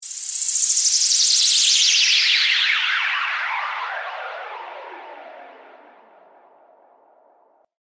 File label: WHOOSH DOWN